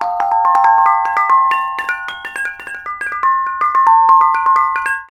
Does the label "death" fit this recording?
No